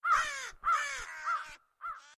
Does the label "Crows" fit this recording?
Yes